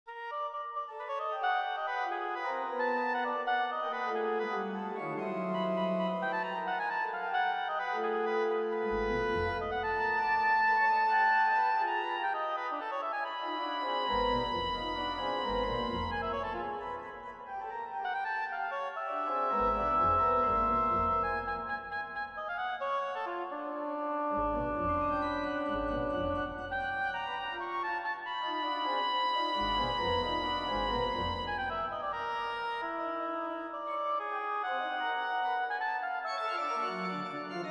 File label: Oboe Organ; Kropfreiter; 3 pieces for Organ and Oboe; Variativ